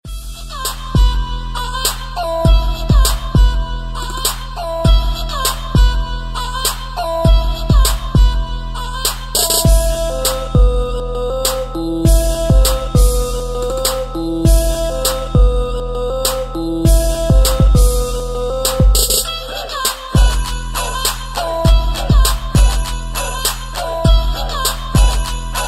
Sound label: cover instrumental